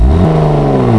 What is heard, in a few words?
v8 rev short loop